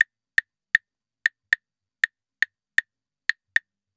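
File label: CLAVES SALSA, salsa drums and percussion; Best Service ProSamples vol.14; AKAI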